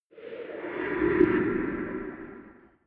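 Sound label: underworld inhale, drones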